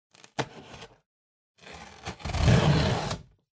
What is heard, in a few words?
Cardboard Box Unbox; ダンボール; 開封; 梱包材